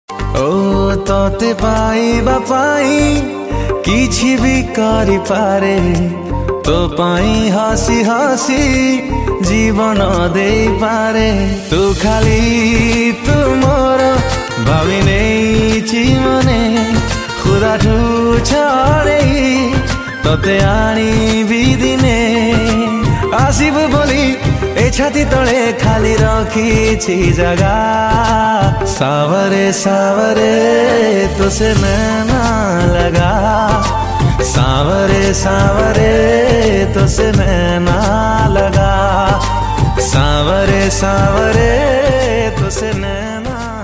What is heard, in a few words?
Odia Song Ringtone